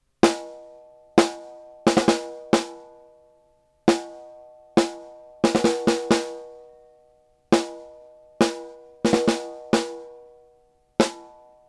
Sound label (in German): Fredenstein F200 Snare mit Kompressor